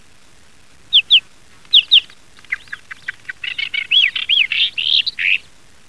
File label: Oenanthe isabellina